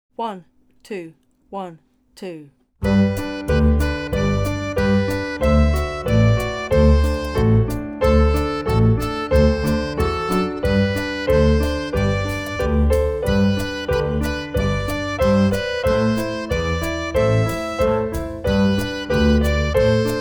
Swiss Dance backing

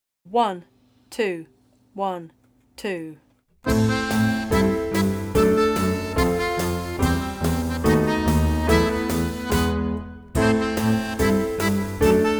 A Csitari Hegyek Alatt backing

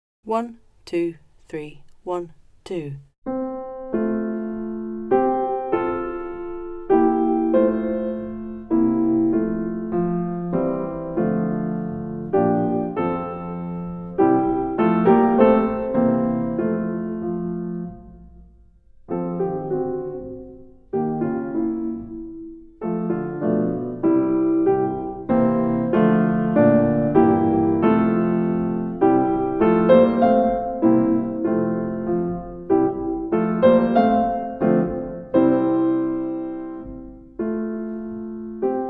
backing

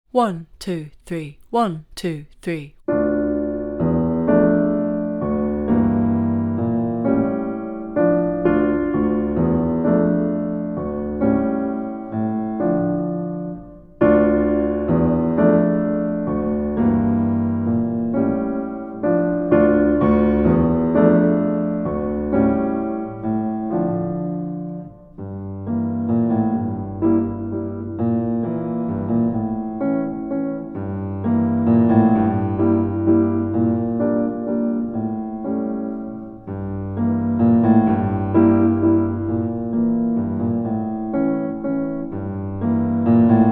backing

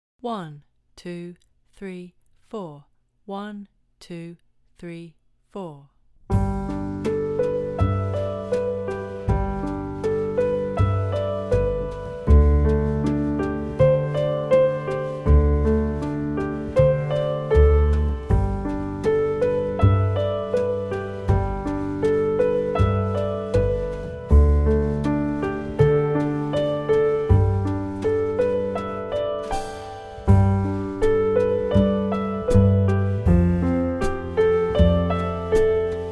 Peaceful Pizzicato backing

